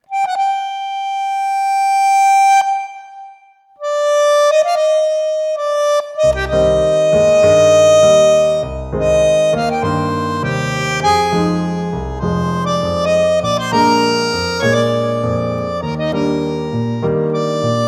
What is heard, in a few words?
Tango